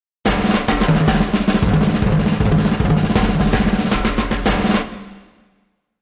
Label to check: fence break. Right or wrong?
Wrong